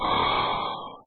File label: breath; Minecraft